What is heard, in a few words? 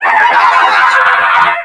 pain; alienarena; martian